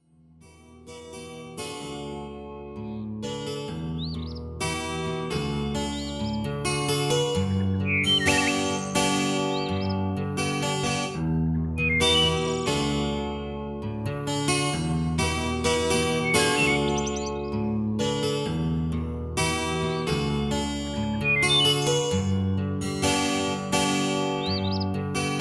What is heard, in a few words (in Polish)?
muzyczny miks relaksacyjny 432 Hz; idac do lasu